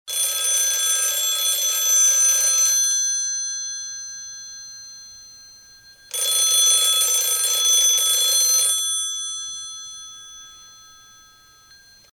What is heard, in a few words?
old phone; bricks in motion sound library